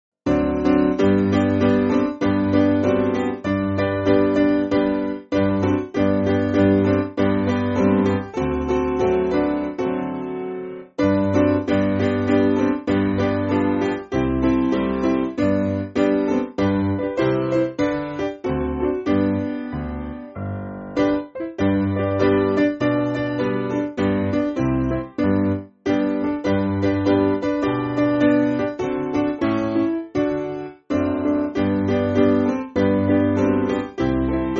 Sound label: I Am Watching For, Piano; Kirk